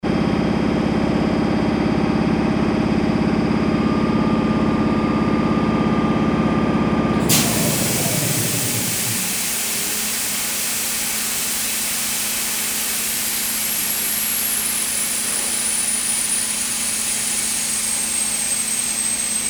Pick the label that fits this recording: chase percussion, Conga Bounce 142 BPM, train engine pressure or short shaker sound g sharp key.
train engine pressure